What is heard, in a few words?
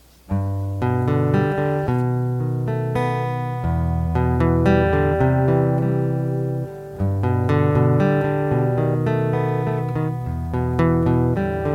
instrumental guitar